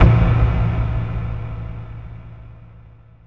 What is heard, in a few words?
Taiko